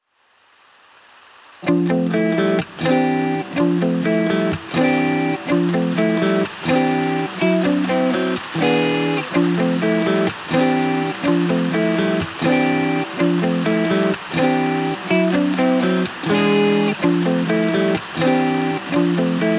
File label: Instrumental